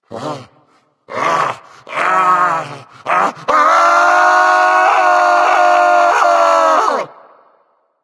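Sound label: nonverbal fear; smite; voicepack; merlin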